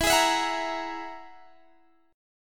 G sharp 7th Suspended 2nd Sharp 5th

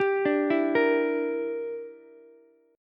A sharp 6th Flat 5th, Ukulele; A#6b5 arpeggiated; JGuitar

